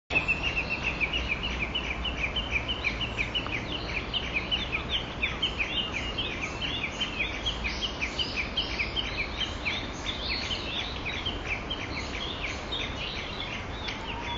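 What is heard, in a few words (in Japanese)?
kojukei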